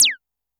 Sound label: korg trident